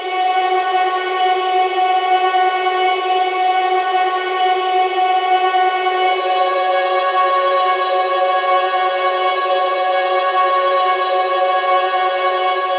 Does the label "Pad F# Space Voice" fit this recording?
Yes